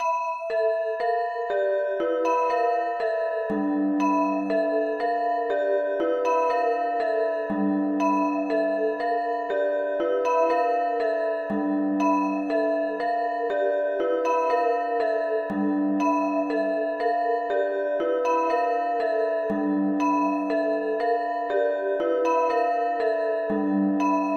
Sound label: Bell Ring